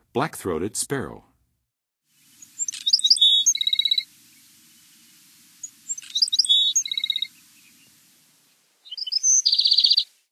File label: Black-throated Sparrow, Songs of Birds of North America; Western USA; Western USA Songbirds